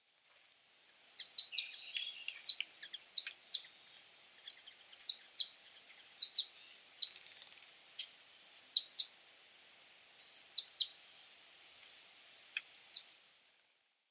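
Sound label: Spotted Flycatcher call; tobishima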